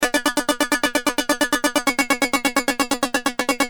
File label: Keys, Industrial